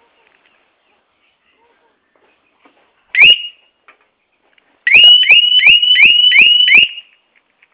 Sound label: v-rod alarm; moto